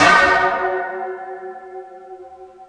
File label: can; drums